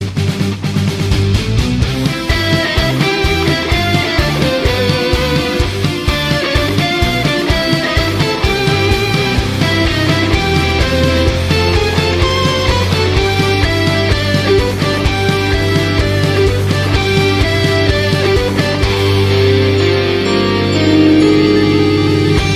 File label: Guitar Cover; Recess